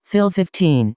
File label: Fill